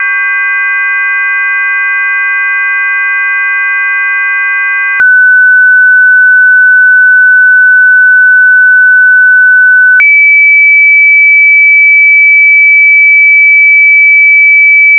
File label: stop tone